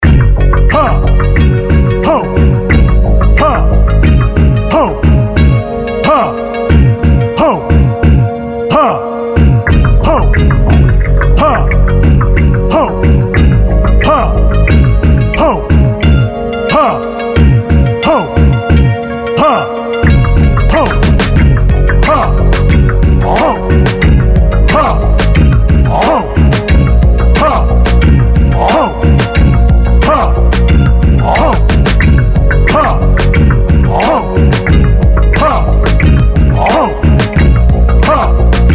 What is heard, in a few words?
Rhythm; Hulk